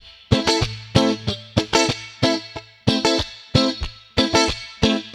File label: Electric Guitar